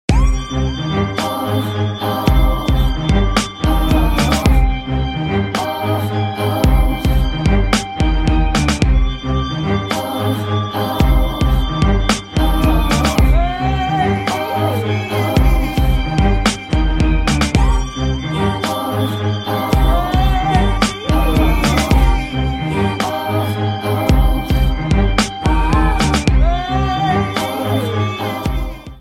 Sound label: iphone ringtone